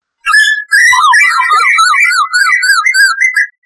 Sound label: koel